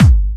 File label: BD Clubby, kicks; VEC1 Clubby